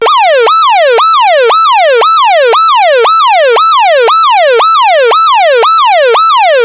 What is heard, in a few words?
Yelp; ELK-120